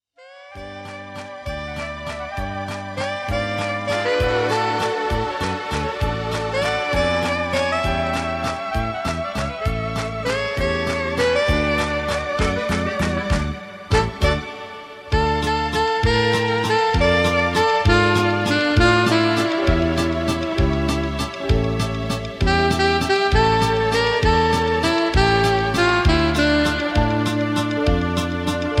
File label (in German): Walzer